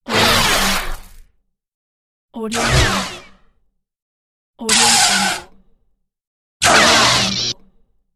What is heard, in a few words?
lightsaber